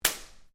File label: Whip Crack Belt Strap; Foley sounds for melee weapons; Whip Sound Effects; Objects